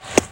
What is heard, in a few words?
sand; Jack